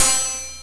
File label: cymb, cymbals